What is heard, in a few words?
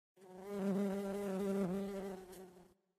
Amb Birdvillage Bees